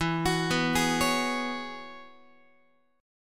E 6th Add 9th E, Guitar; arpeggiated; chord

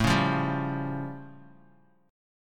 A Augmented 7th A Bass Standard; strummed; 17,16,15,12; JGuitar

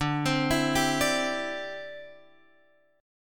D 7th Suspended 2nd Suspended 4th, Guitar Open F; D7sus2sus4; arpeggiated

